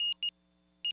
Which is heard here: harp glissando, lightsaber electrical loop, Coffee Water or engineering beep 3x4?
engineering beep 3x4